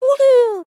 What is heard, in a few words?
Wahoo; Neru; SM64 CO-OP; Triple Baka Pack